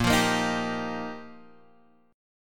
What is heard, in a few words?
G Minor 7th Sharp 5th, A sharp